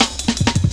jazz ridez cut, drum loops; Zero-G Jungle Warfare 1, 2 & 3

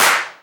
Claps; Vengeance; Essential Clubsounds Vol.4

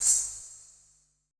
E SHELL, ETH PERC

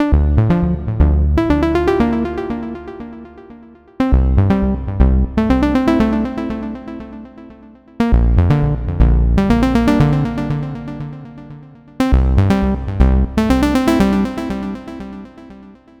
Trance Synth

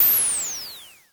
Dual-Percussion-Synthesiser; Klone

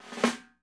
ROLL, 50S JAZZ; Northstar - New Gold VOL-1

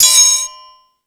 Crash, Drum Machines E-MU Pro-Cussion

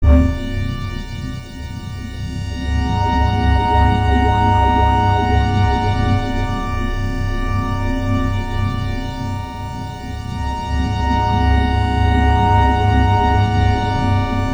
Resonance String; AMG - Prototype Drum & Bass

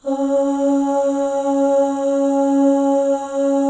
OH, FEM, Symphony of Voices; Spectrasonics

